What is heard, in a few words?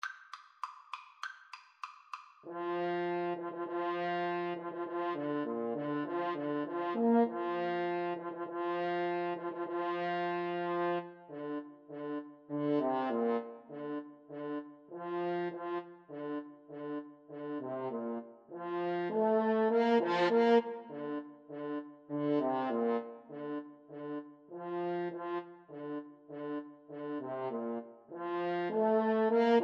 French Horn Duet; rossini william tell